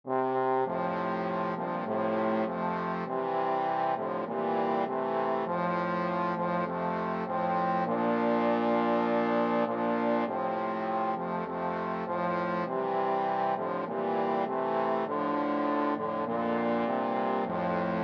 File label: auld lang syne, trombone quartet